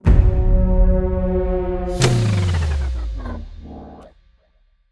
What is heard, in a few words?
game lost; factorio; Factorio 0.14.19